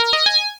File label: level up; svencoop; cards